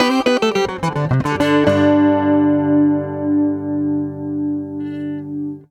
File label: Ac 12 Str Gtr 109bpm G end, Twelve String Guitar, African Guitars; Quazibeat; African Secret